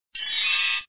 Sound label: Gift Collected; snowline